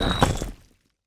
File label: lock, doors stone; dragonroll